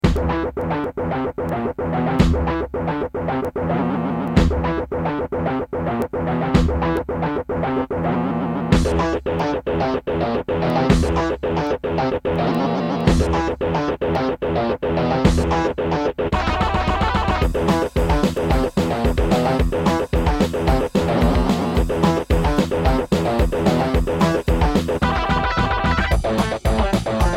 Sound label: Turtle Beach Tropez; Doom